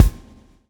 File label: SWNG